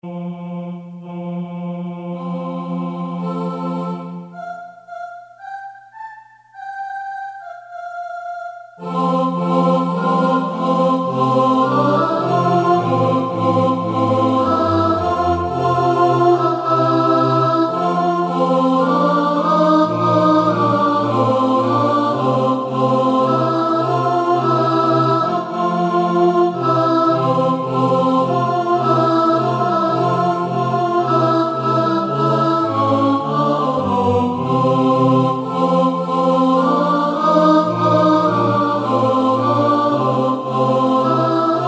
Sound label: ALTO